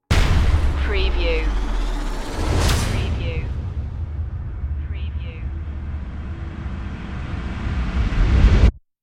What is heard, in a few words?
eternal horizon stinger